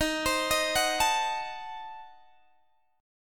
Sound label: D sharp Diminished 7th D sharp Guitar Open G